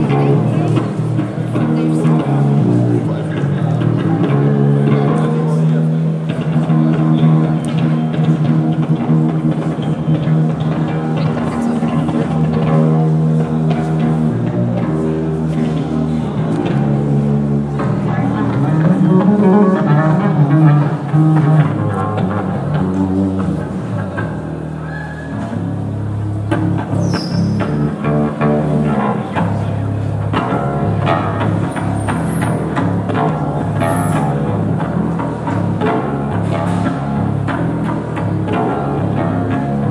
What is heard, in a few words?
bass check